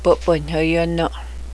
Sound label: drumstick, powwow